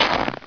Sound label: dirt, player; wartso